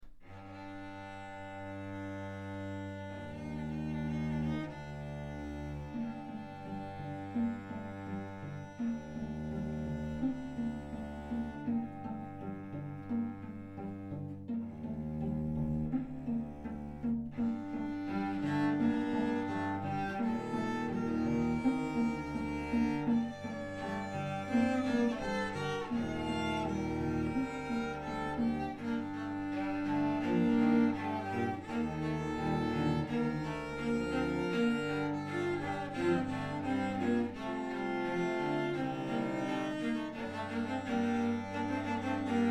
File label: Cello Ensemble